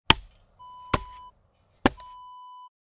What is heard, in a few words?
shovel tapping ground